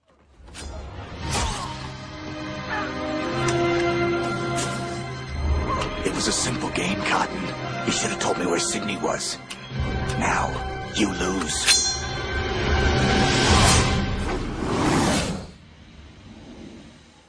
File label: scream